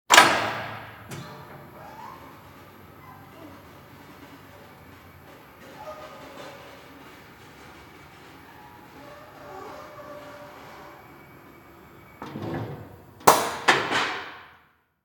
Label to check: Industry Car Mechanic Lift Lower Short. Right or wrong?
Right